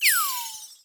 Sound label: cash stack sym fall; netent